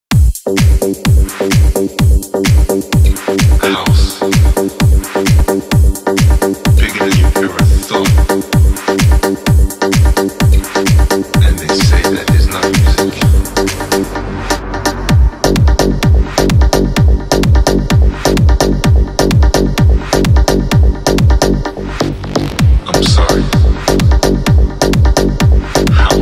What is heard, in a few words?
deep electronic